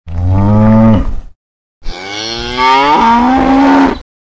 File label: cow; SuperTuxKart; black forest